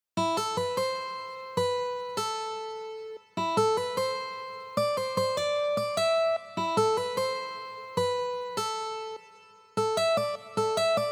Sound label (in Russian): naruto na gitare